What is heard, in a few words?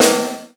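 gated snare drum, Drums Acoustic; USB Soundscan vol.10; AKAI; 90s sample CD; GATEKIT 2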